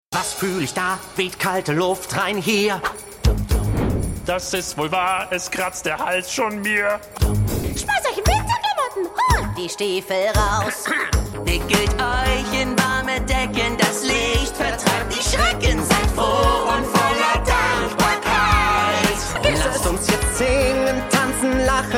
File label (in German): Gesang; Weihnachtsfolgen; Fraggle; Gobo; Boober; Red; Wembley